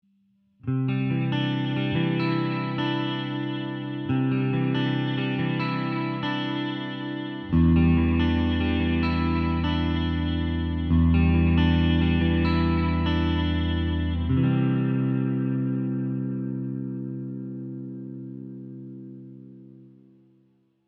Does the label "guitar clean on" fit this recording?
Yes